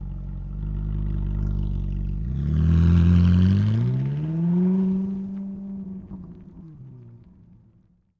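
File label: ferrari 458 exterior fast away by; xy rsm191; Sonniss GDC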